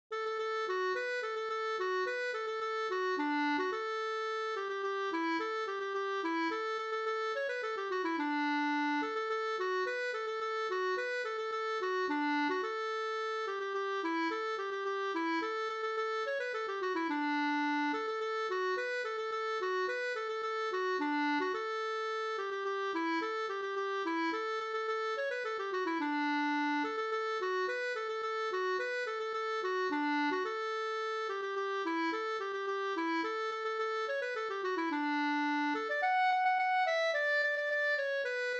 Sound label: polka; Hilmers polka, upptecknad av "kvintus" och spelad av Tage Fredriksson